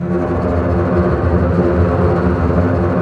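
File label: double bass tremolo; String Master Series; Cbs FX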